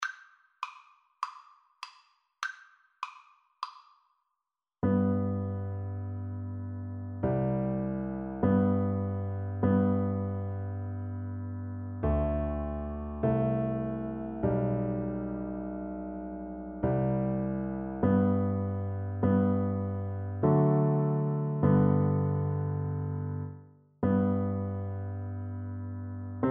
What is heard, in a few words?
swing low Piano Duet